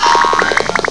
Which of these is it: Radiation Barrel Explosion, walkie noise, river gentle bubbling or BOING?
BOING